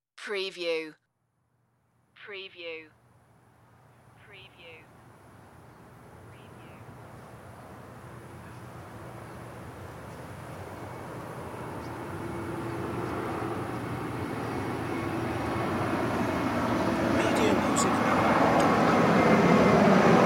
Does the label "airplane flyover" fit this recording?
Yes